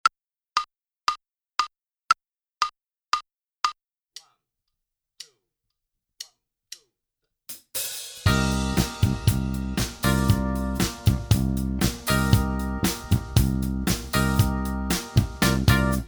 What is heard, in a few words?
Minus Guitar; Inst Ensemble